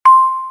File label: receive